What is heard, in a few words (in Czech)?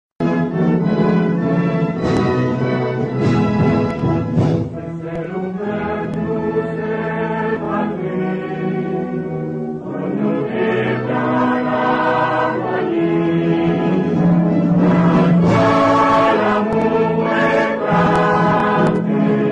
hymna Seychel se zpevem